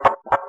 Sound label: totem